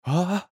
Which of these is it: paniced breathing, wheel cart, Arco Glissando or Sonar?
paniced breathing